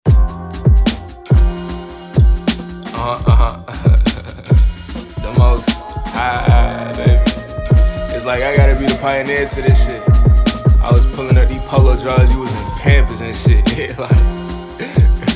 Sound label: HIP HOP